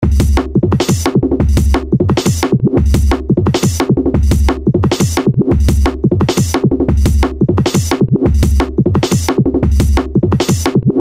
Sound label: 175bpm